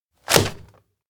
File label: wall hit hard paper; anemoi